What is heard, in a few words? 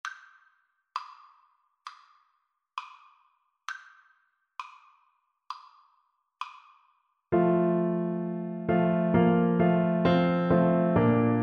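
viola karaoke; Converse, Charles C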